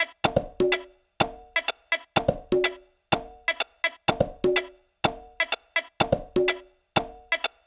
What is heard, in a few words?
top 125